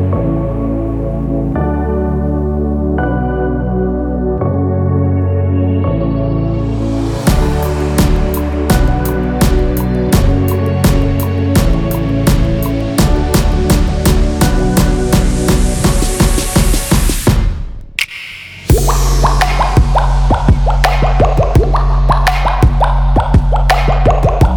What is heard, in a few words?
alarm